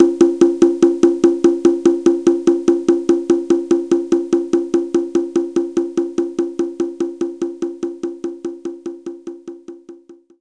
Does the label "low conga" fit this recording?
Yes